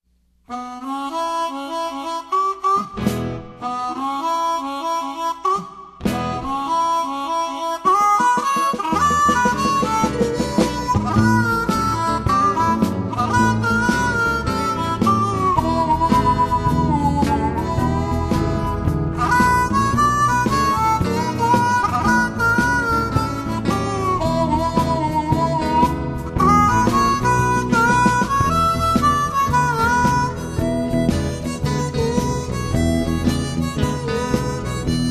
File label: blues medley